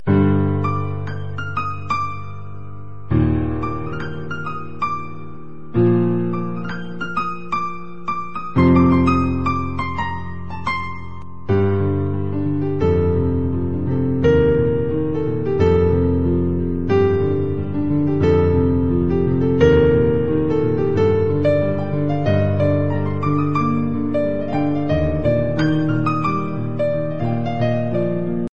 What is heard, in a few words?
theme tone